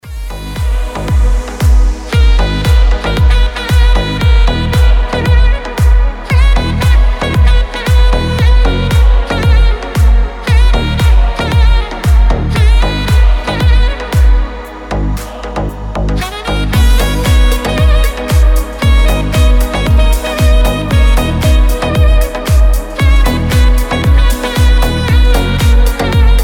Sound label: saxophone